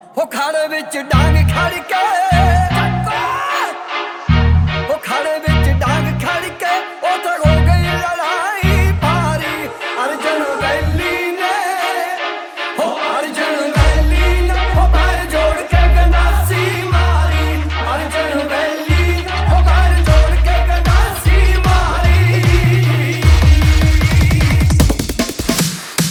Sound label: Remix Ringtone